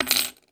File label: coins table